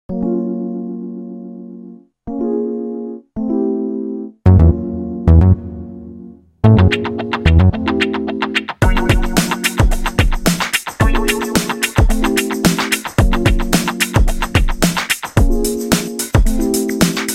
funky beats

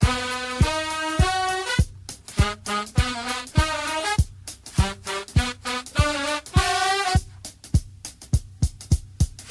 trumpet music

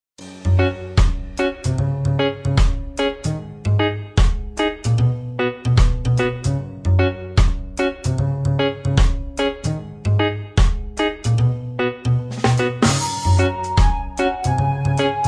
reggae; super mario

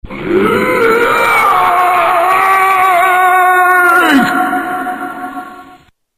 zombie moan

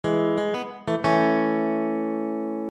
guitar chord riff

